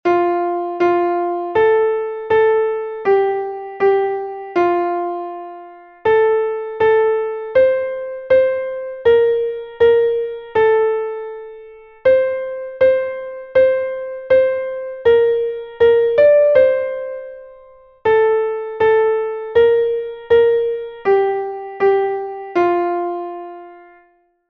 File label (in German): klavier; muede bin ich geh zur ruh; melodiemeister